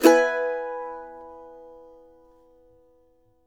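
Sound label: MANDO MAJ E major down, Left; Sampleheads; Whole Lotta Country 1 & 2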